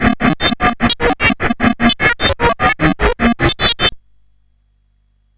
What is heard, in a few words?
Synth Sample, CSG8580R5